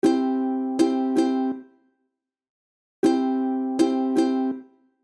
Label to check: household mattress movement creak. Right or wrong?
Wrong